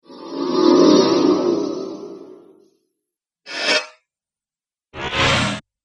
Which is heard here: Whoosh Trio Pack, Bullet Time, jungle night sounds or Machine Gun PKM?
Whoosh Trio Pack